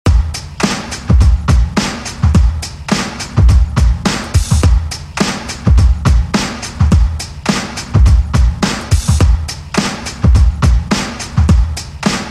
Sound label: Pop Redrum; Deejay Hit